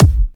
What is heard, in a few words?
kick